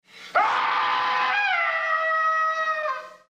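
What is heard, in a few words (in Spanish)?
grito chistoso, funny scream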